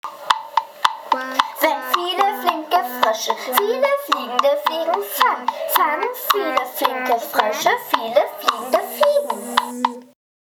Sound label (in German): Froesche